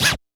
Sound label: kit, Yamaha Motif-ES8